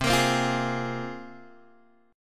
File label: C 7th Suspended 4th Sharp 5th, Guitar, Open G